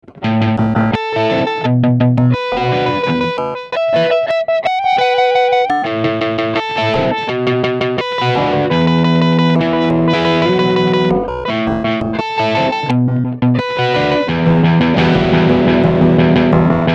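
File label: hologram electronics microcosm guitar blocks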